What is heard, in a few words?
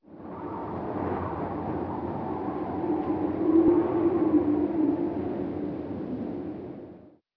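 trailer wind inside park loop; de submarine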